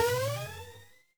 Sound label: retro game power up